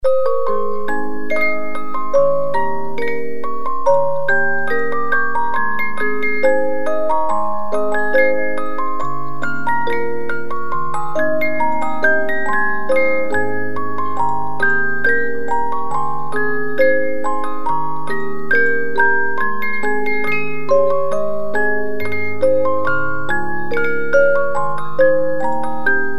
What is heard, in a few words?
Glockenspiel, music boxes, 50-Note Movements; The Magic Flute